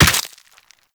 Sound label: Operator Run Bone, Footsteps; Warframe